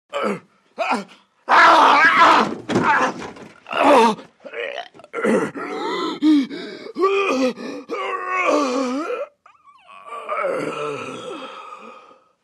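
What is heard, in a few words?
Male Have Heart Attack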